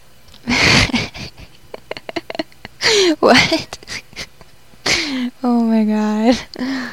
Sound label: laugh and omg